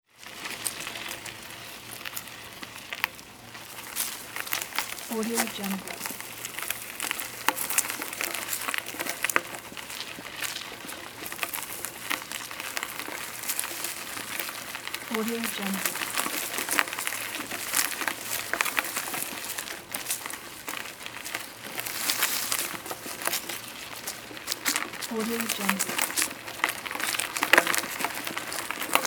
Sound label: bike riding in dry forest